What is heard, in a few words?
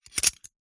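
household bolt metal lock or unlock held in hand; zapsplat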